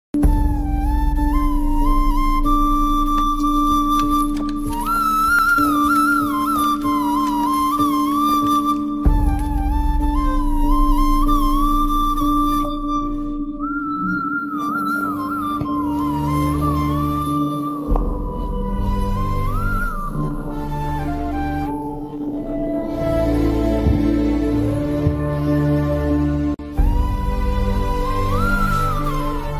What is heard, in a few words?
sad bgm; sita ramam; dulquer salman; reshmika; hanu raghavapudi